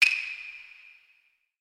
clappers

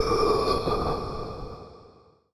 ghost breath

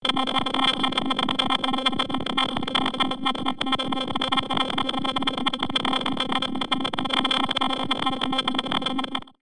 noise

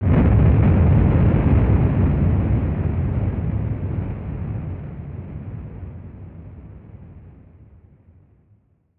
burst

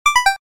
button

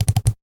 click

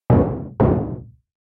knock

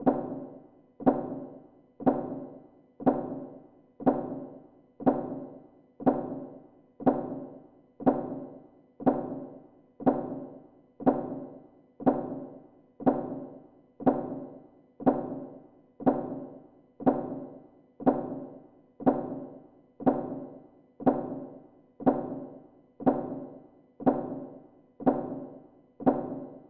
clock

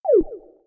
button

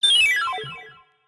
magic

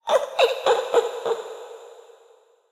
ghost laugh

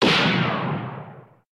gun fire

